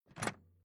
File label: Tool Box Lifting Tool Out Of Box Metal Rattle; Tom Hutchings